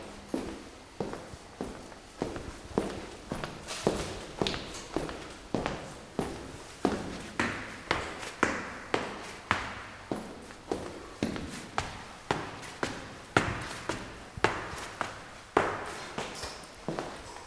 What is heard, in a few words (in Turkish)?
apartman bina icinde yurume ve merdivenden cikma sesi